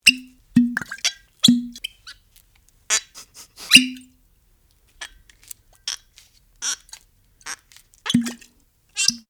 Cork out of Bottle, Various corks out of wine bottles with some squeaks